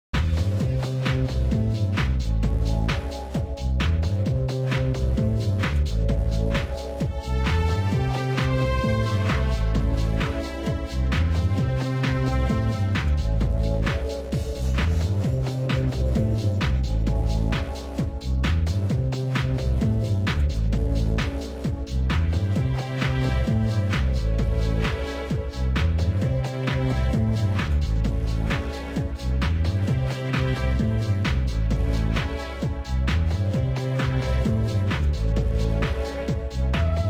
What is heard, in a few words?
level music